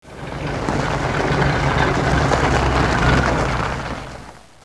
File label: car sound